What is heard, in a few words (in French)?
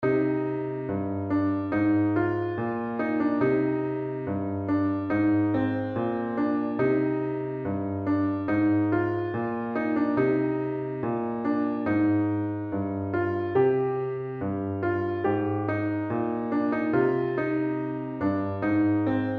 Pavane